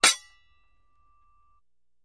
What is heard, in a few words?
Axe Clinks, Dull; Single Medium Clink With Metal Scrape. - Metal Scrape